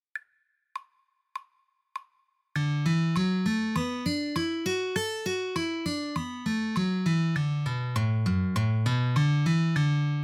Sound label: All 5 CAGED Scale forms in D, guitar